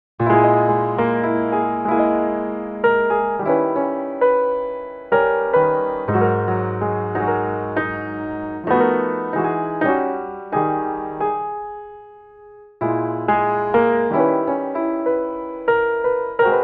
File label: solo piano